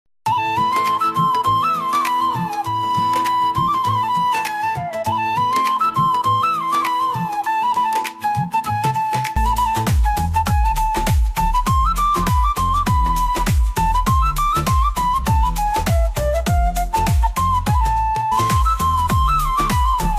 new motivational flute bgm ringtone flute background music